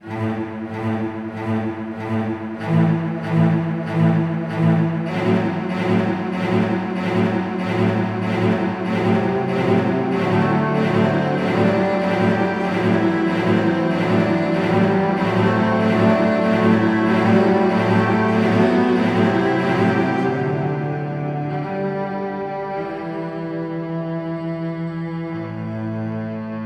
tremolo, chiptunes

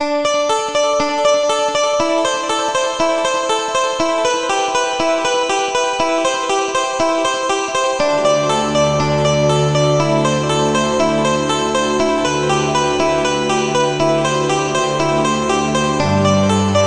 ostinato, chiptunes

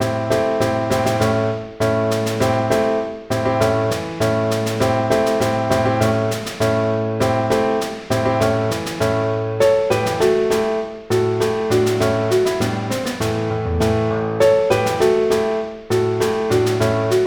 snare dances

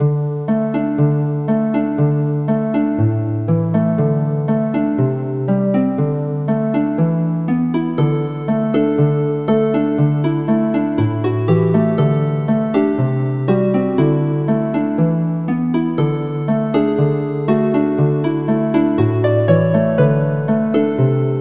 mighty fortress riff, chiptunes

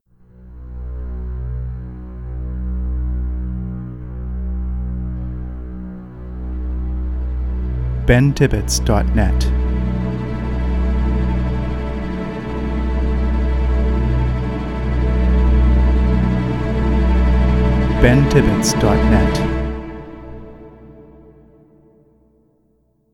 Tremolo Strings Ident

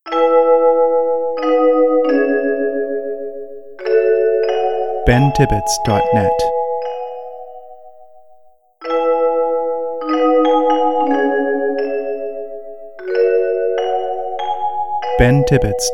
lullaby; pearisburg

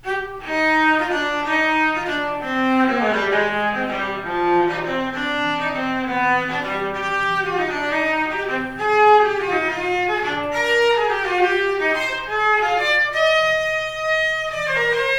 Cello Suite No 5 in C minor; Bach

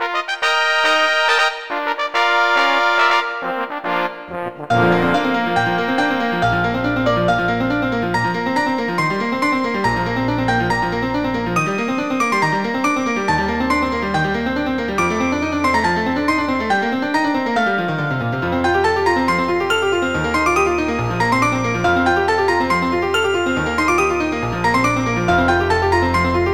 fanfare and peace

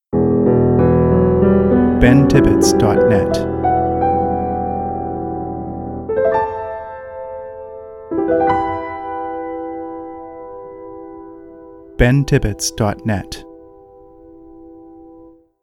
Sad Piano Ident

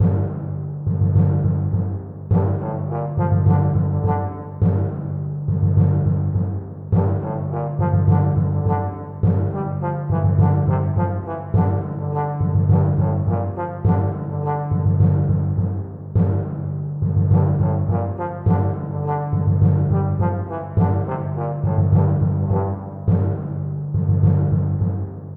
duet for trombone and timpani, chiptunes

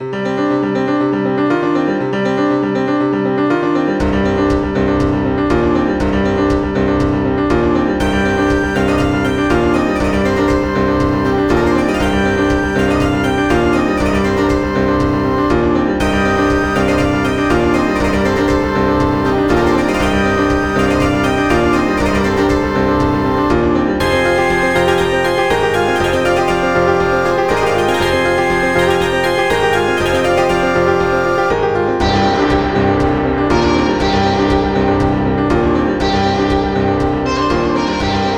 dance in C minor, chiptunes

